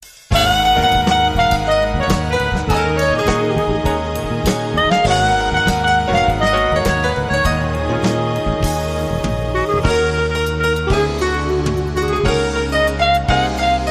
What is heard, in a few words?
Clarinet Samba